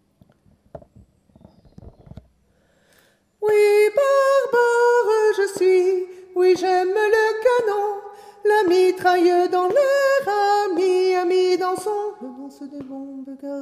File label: danse sop